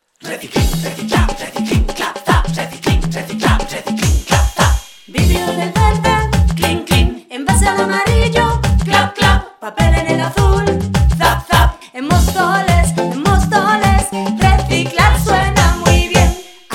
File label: jingle